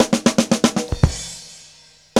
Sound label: Fills, Roland; Sound & Vision - Gigapack I; Perc